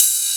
Hats Electro Open

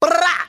brrrrrr, Voice

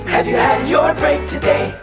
SUNG

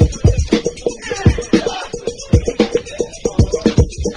funny noises; Drum loops

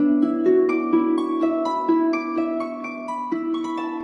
keys, Loops, Sad

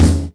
Kicks

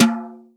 vunk, toms; Real

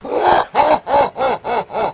laugh satan

